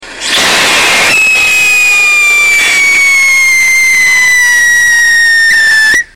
copper oxide core potassium benzoate, rockets whistle tests; Core Burner